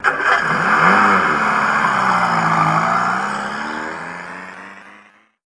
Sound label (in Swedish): bil som startar